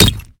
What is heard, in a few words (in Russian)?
metallicheskij zvon udara molotkom